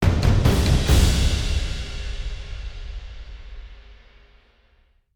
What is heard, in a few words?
Combat Stinger